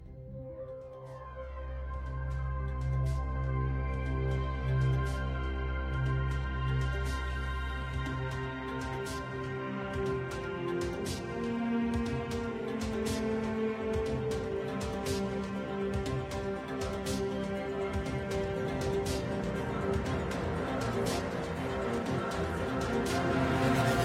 Outro; Voltron LD

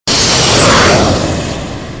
Aeroplane

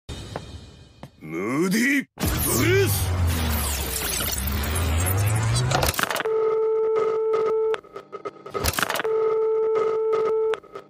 Blues Ringtone

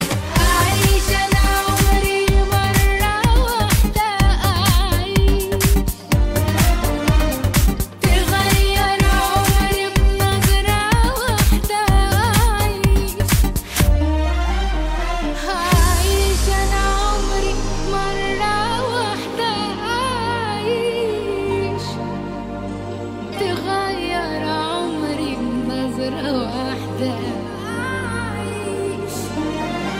Arabic

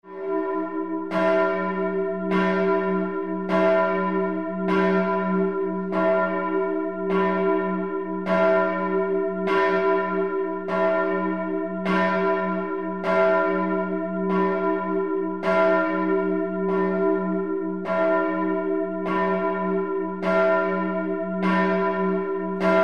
Church Bell

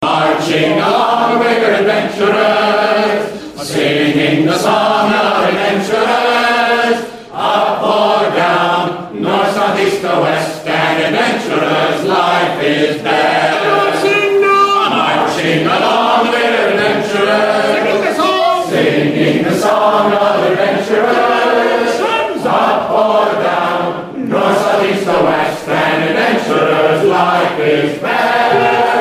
Club Song

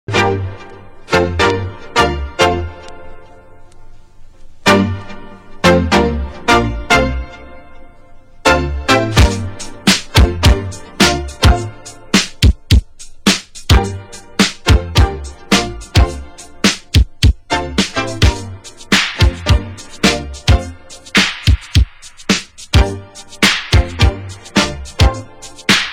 Ring And Dance

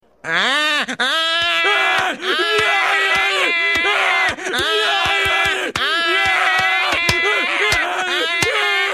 Nyaahh

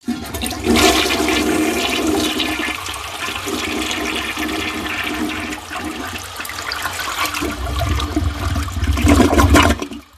Toilet Flushing

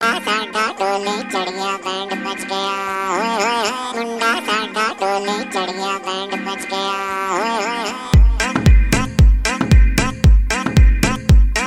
Msg Tone; Dostana

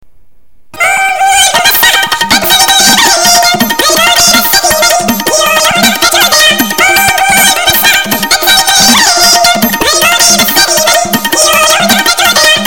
Gayatri Mantra Child

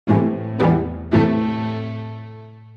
Violin

